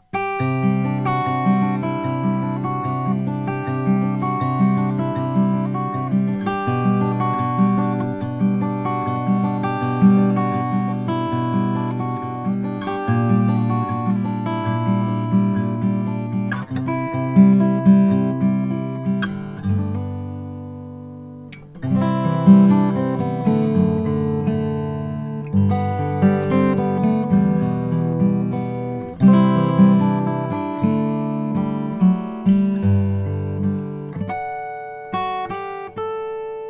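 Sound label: mcpherson mg 4 5 brazilian rosewood; 2008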